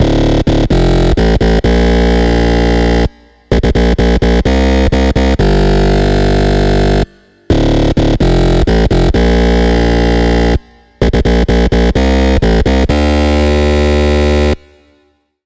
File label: Bass; Routed Sound; Spire Expansion - Hyper Gamer Vol.1